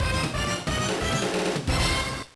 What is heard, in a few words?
Mission Cleared; AirForce Delta Storm; AirForce Delta II; GBA